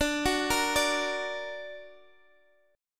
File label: F sharp Augmented D